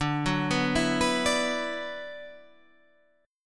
D 6th Add 9th D, Guitar, Standard; arpeggiated; capo 9

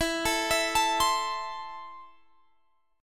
E Suspended 4th Sharp 5th, Guitar Open G; x,21,19,21,22,22; down

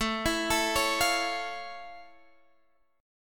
F Augmented Major 7th, chord

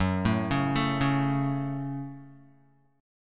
F sharp Minor, Guitar; arpeggiated; JGuitar

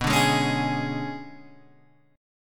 B Minor 6th Add 9th B down Guitar Open G

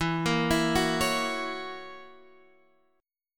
F sharp 7th, guitar

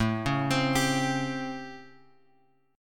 A 7th Sharp 9th A; A7#9 Chord {5 4 X 5 X 3} arpeggiated

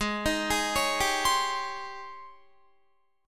G sharp Major 11th G sharp, Guitar; JGuitar

